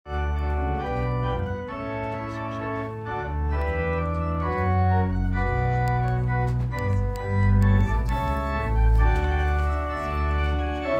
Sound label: organ and trumpet prelude